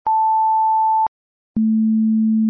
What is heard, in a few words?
sine